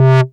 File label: Bass Multi Rev C; Vengeance Samples